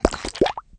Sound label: Bubble Gum Burst; Candy Crush Soda